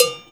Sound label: COWBELL; InVision Interactive; Percussion Slam